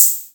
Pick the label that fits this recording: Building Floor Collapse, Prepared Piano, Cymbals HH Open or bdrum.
Cymbals HH Open